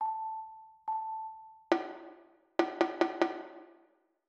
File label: rhythm simple 1 beat